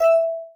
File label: item reward; Test Realm